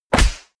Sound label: stylized fist punch